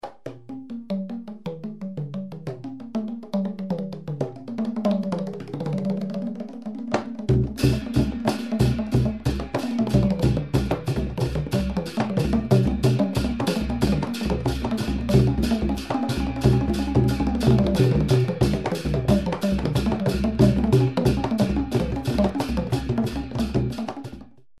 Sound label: thai, ethno; amazing thailand